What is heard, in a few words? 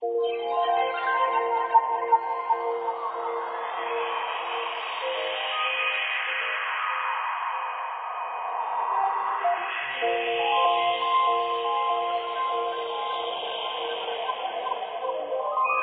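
Music Ambient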